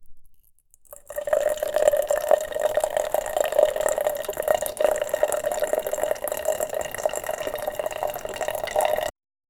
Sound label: oil pouring on the ground